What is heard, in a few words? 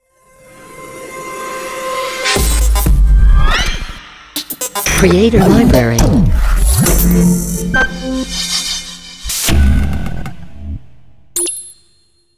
Hi Tech Stinger; Logo-Idents